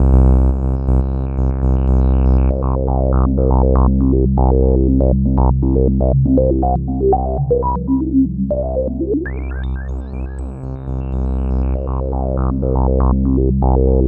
Roland JV 2080, interval; MG INTERVAL

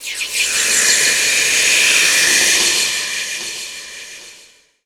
HOT METAL; ENVIRN-AMB

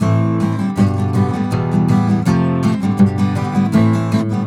AM GTR, Celtic Flavours

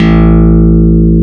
XFM BS, FM Syn Bass, Synth Bass

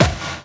Kick Drums; Drum Hits; Nu Dance Electro

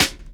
SD, Dance Drums

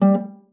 STOP F#3, harp; Xsample VOL-8; HARP+FX

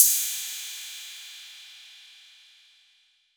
PERC 808

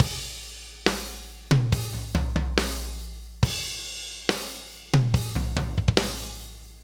Loop, Drum Tools, Laid Back

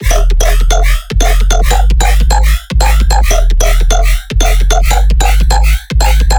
bass loop 150 E; iceclimber; Virtual Riot

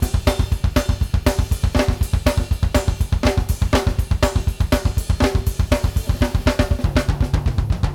DBL UP CYM L, Drumworks

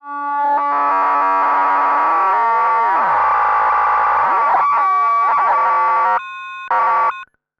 Odd Modular; radiosonics

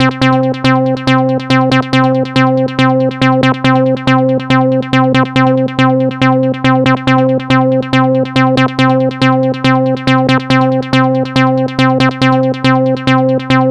NRG Lead, trance

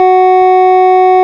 ORG, Farfisa Clarinet

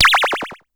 Pollard Syndrum

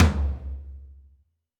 Tom Pwr Floor, Alesis D4

